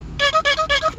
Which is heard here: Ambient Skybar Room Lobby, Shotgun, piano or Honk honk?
Honk honk